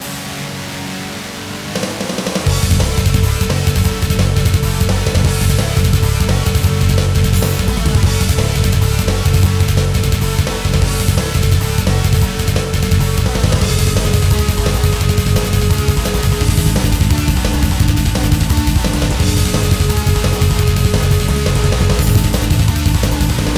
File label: roland sc88 pro; thunder force 4